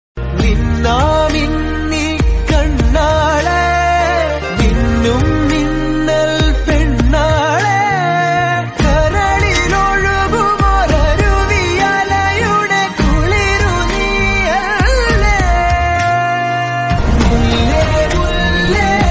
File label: malayalam ringtone; anugraheethan anthony